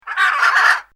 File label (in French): Perroquet; MOBICLIC 72; CPLUSCLAIR